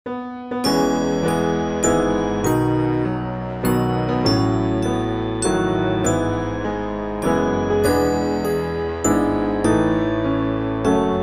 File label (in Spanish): Cumpleanos feliz Karaoke; Musica infantil - materiales de apoyo a la educacion inicial en iberoamerica